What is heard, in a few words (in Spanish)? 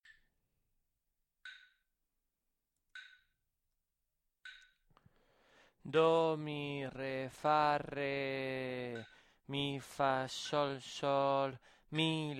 RITMO